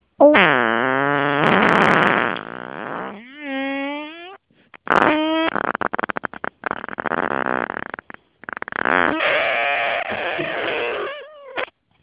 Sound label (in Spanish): Multitímbrico total, pedo